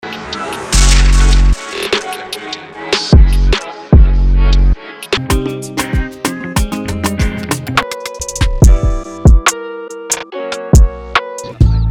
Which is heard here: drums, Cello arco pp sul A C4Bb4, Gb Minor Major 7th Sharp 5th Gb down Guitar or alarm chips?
drums